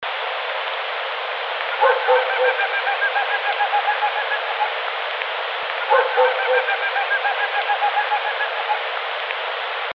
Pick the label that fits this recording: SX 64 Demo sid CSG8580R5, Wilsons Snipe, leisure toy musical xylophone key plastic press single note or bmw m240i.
Wilsons Snipe